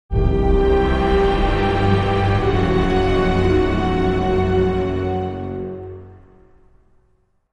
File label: ambience dark side; Knights of the Old Republic II-The Sith Lords